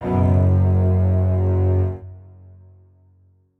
strings

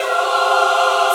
choir

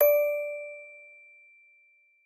musicbox

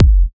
Kick; WAProd Free Kick Samples 2018; WA Kick Pack 3

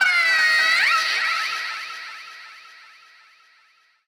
vox

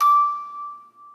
musicbox

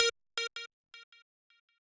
lead

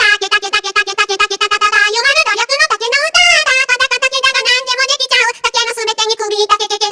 Vo Main

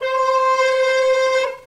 Synth C6

